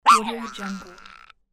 creaky bird hit moan

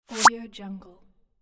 bubble swoosh; audioheart

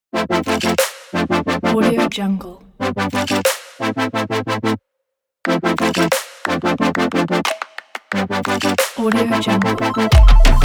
bright modern percussive logo

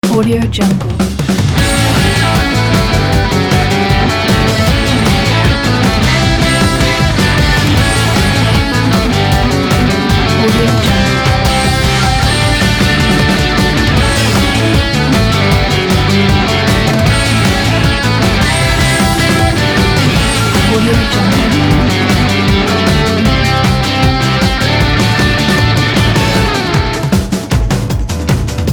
show opener; musicalsolutions

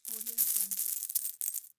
coin pile drop

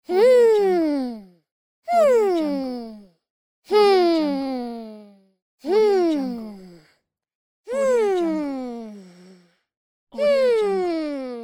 cartoon character voice hmm mmm pack

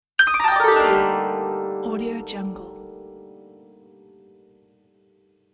beautiful japanese koto scaledown logo

